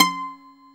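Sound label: BZ F C, bouzouki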